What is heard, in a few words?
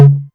tom mid, Novation Drumstation